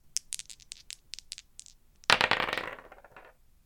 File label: dice